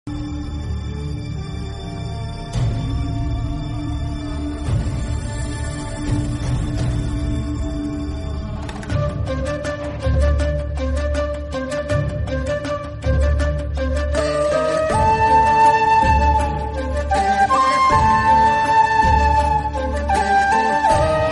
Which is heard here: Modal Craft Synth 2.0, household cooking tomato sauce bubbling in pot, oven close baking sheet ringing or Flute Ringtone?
Flute Ringtone